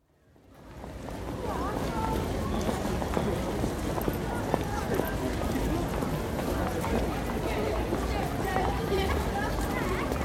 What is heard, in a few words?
Shop Precincts, Shopping mall interior, busy with footsteps and chatter; Stores And Mall; BBC